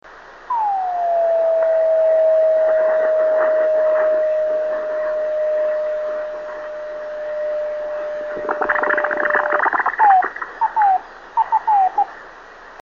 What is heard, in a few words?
26980KHz, driftnet beacons